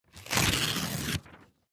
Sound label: Thin paper tearing